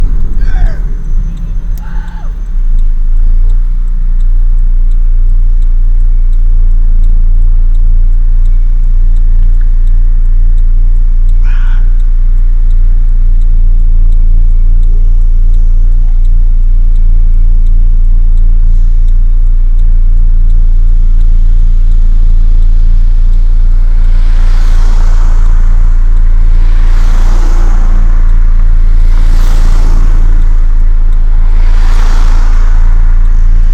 Rockers @ Sunnyvale Traffic Light (distorted car engine noise), binaural